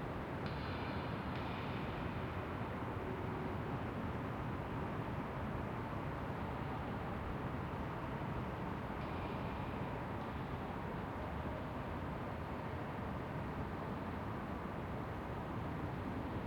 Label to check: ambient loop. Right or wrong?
Right